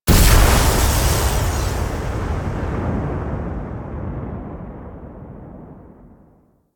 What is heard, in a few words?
Energy Strike End; Warframe; Ambience; Rail Jack; Venus Region